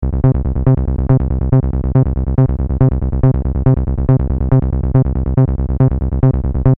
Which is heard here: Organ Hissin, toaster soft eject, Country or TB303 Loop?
TB303 Loop